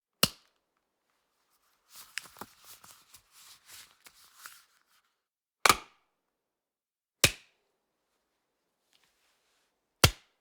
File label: rubber glove pulling on series